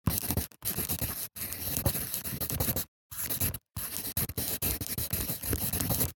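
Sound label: Handwriting Wax Crayon; soundbits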